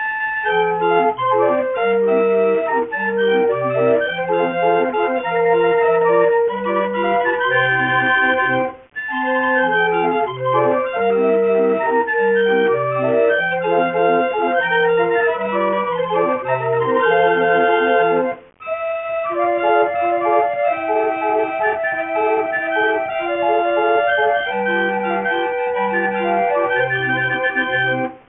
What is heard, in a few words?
organ